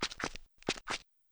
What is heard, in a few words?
Zombie Footstep; gamerip; Zombie Five